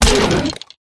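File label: Dragon Skeleton wound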